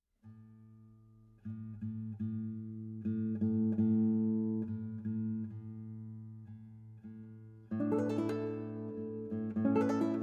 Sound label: Guitar Solo